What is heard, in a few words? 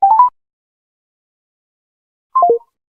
samsung call start and end